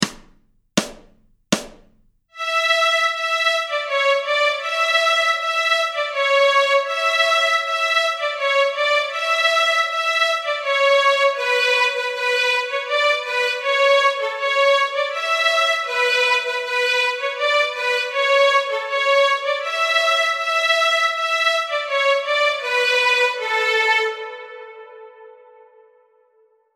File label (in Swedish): Stråk A, Nyckelharpa; Tredansen; Notträning